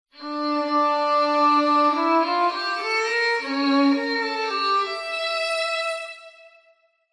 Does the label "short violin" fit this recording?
Yes